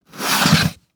Foley Footstep Soft Sole Work Boots Scrape On Cement